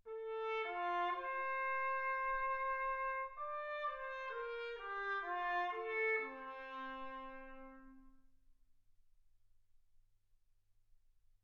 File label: trumpet